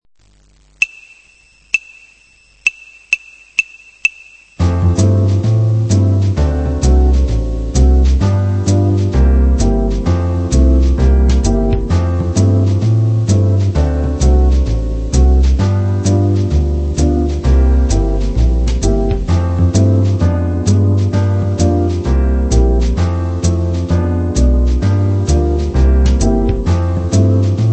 doprovod, kytara

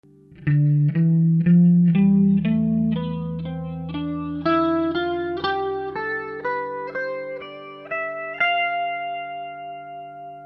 dorska, kytara

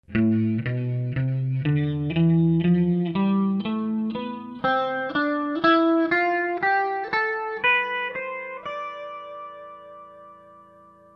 aiolska, kytara

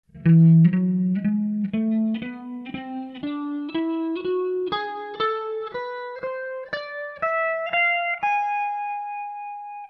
Ami melodicka, kytara

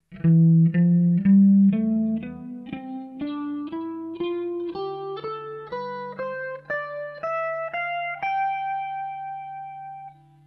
frygicka, kytara

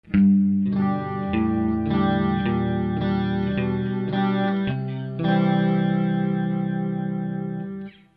kracejici bas, kytara

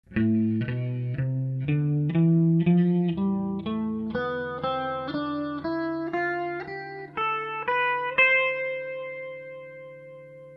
aiolska, kytara

